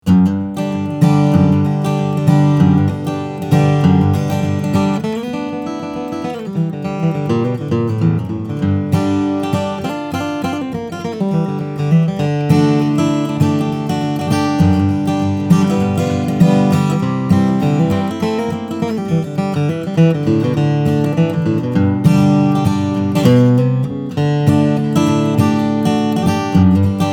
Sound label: martin d-45ge, steel string guitar; flattop